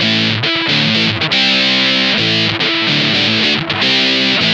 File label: BIG RIFF, Funk Guitar; Zero G - Funk Guitar